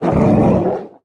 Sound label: angry